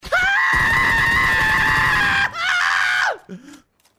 scream

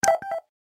ui sms received

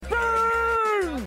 heavy boom

